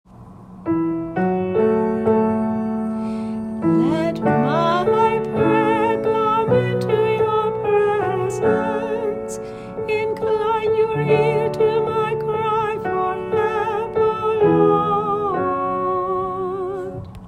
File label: Antiphon; 32nd Sunday Ord